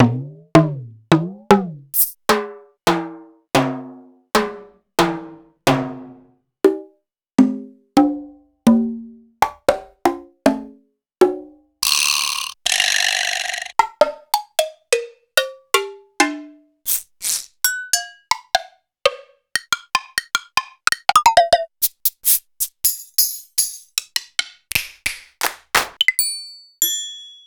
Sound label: Alesis D4 Prc